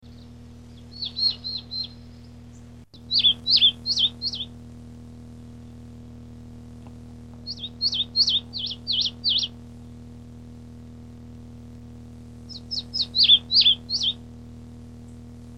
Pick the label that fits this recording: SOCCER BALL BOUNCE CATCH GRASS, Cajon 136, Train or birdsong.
birdsong